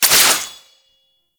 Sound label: Brood Impact Launch; Warframe; Oraxia